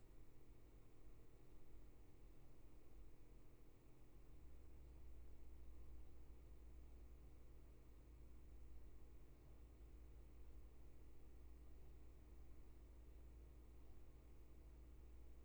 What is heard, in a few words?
roomtone